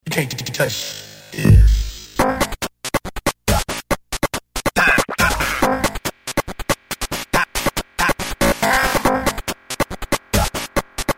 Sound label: gameboy